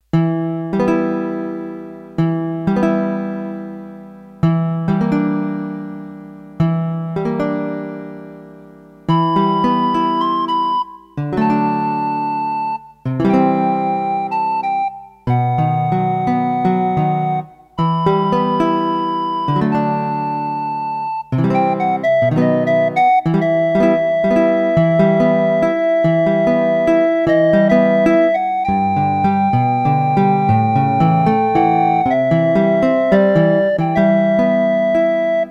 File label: Acoustic Club, Roland D-10; Factory Patches